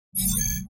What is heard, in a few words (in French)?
reparation alarme; La-Fabrik